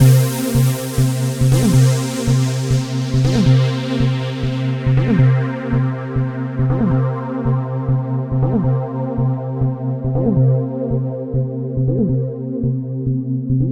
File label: Trance Synth